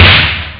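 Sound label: snare drum; ST-XX Amiga Soundtracker Sample Packs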